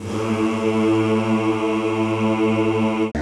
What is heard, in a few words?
CHO A1; Sound & Vision; Gigapack I CD 2; CHO Zzzz + Vvvv; CHO Vvvvv